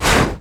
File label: basic attack dragon; LoL; shyvana